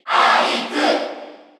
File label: Cheer Korean; Ike; SSBU